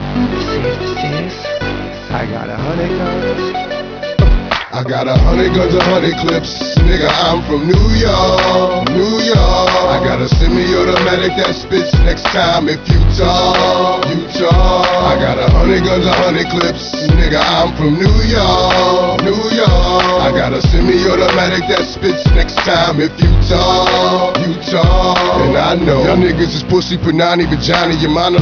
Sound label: Rap